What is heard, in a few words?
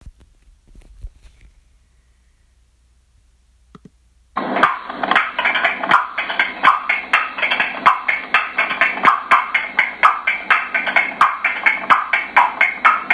wood clapper